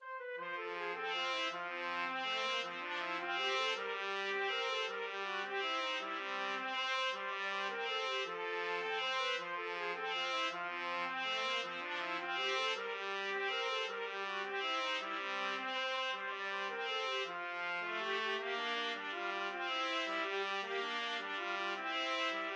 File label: mexican hat dance trumpet duet